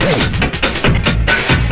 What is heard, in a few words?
LOOP SD, Drum & Bass, 140 BPM